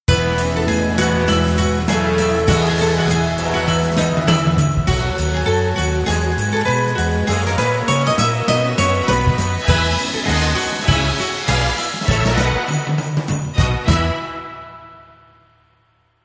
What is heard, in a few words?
Deck the Hall (jazz classic)